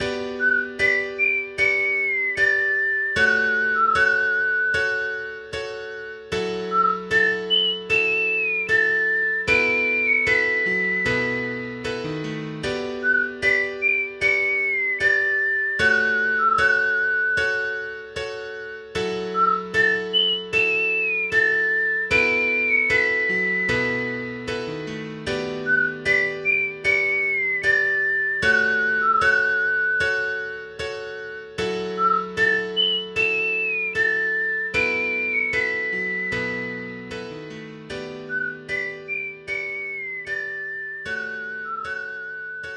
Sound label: Game Over